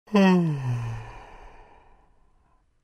Male yawning short

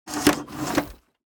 Sci fi gizmo mechanical movements

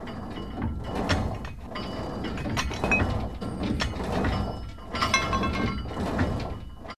Power rack gym machine in motion

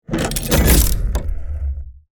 Steampunk heavy mechanical movement

